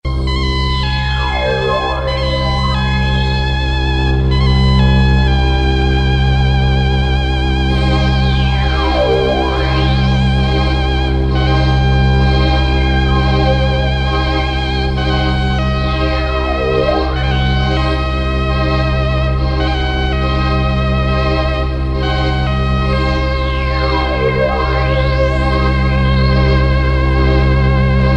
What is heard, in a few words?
Easy Flute Mix